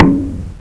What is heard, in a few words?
punch; Technoids 1.0